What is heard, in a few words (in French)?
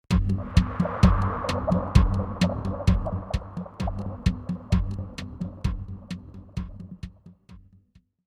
Interlude